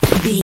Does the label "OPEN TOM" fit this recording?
No